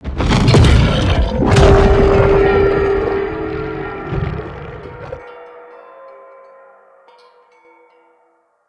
Struggle Left Head Start; Corrupted Bromius

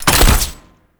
Bow Shot

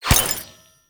Glass Shatter Damage Hit; Warframe

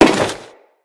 Crewman Step Default, Footsteps; Enemies; Corpus

